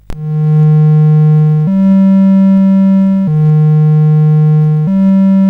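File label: Magicians Ball, MOS 8580 SID chip music, games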